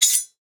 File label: dagger pickup